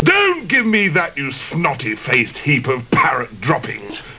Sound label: Disk In Sound